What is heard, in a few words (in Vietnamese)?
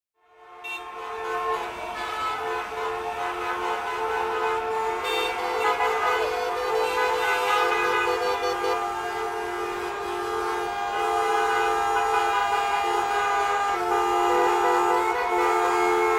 Tieng tac nghen giao thong